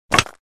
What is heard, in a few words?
Drop battle axe on dirt